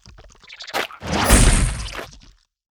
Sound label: seedmon atk; monster